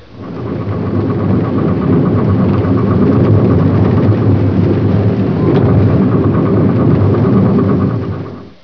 cv joints; Muir

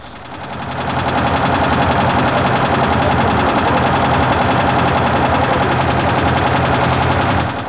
loose valves; Muir

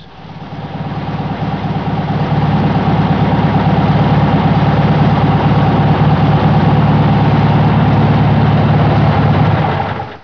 norm engine; Normal Engine Idling on All Four Cylinders; Muir

